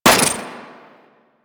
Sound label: Alt Mech, Weapons; Warframe; Tenno; Miter